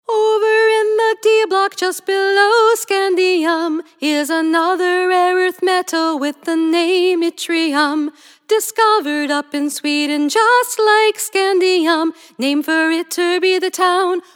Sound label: Scandium Element Jingles